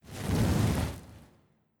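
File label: Cult Fire swoosh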